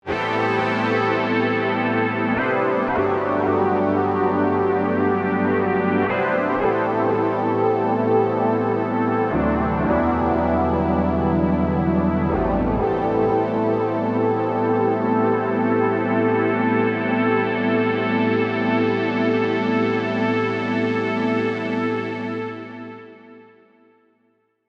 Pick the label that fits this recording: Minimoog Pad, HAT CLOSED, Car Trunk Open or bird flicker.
Minimoog Pad